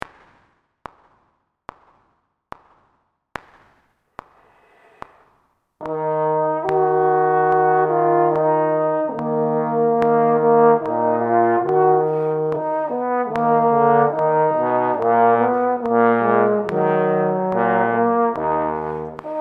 Min e flat, trombone; E-flat minor; The Tone Ladder

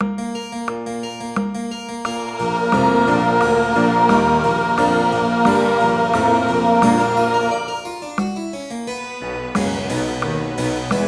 intervals tenor 88; tenor trombone; Note Placement: Intervals; Tempo = 88; First Habits Warm-Up; Extending up to high B-flat and down to pedal B-flat

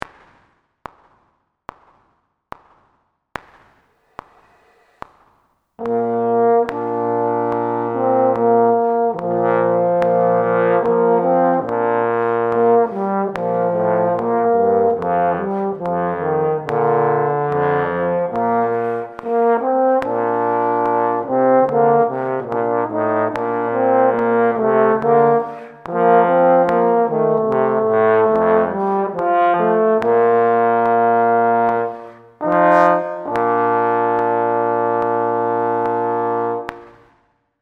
Maj B flat, trombone; The Tone Ladder

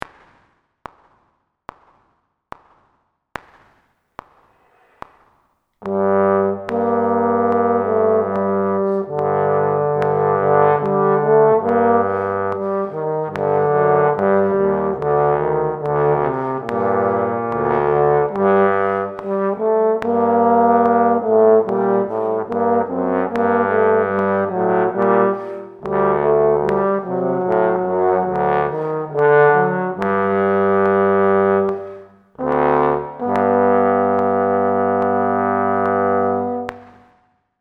Min g, trombone; The Tone Ladder

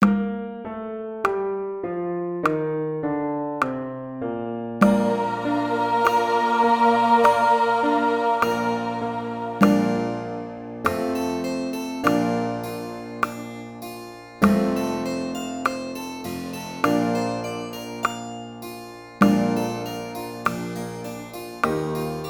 buzz bass; bass trombone; Air/Buzz; Tempo = 50; First Habits Warm-Up

